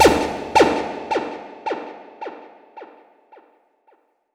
Acid Echo; Sonic Foundry (Sony Creative Software); Ugly Remnants VOL-2

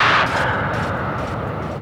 Kaos FX, Ambient Grooves

